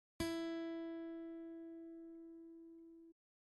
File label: Tuning high E, guitar; Stringing and Tuning